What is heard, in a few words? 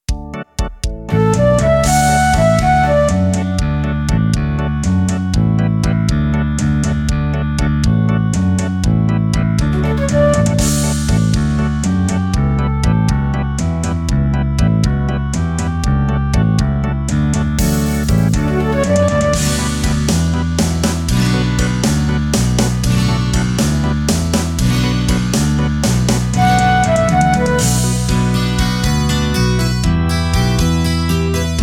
orchestral